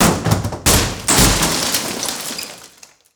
wood debris

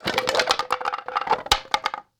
wood drop

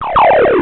BIG ZAPP

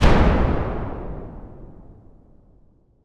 distant explosion

